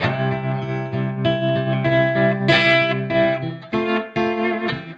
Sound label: 096 crunchy blues guitar; GUITAR LOOPS